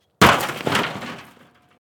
Corrugated Iron Crash

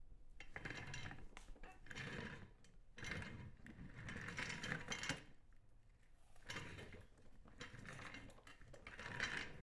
Rolling Chair On Tiles

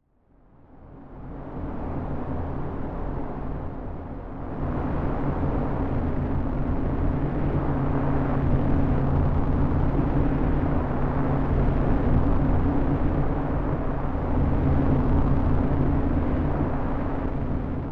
Storm Distortion

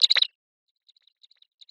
Bug

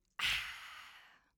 Refreshing Noise

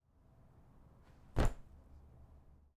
Dropping Clothes

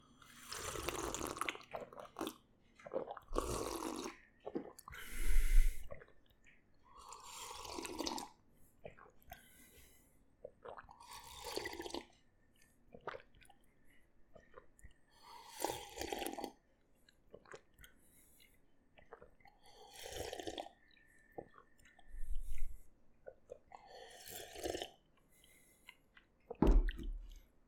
Drinking Lots Of Tea

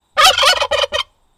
Turkey Call

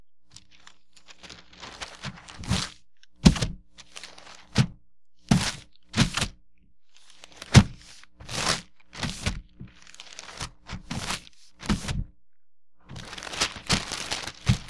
Folding Paper

